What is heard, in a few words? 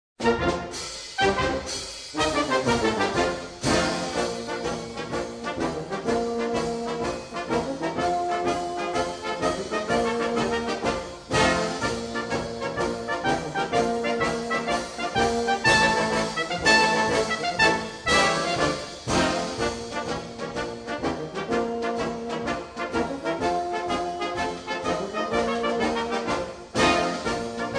March Danoise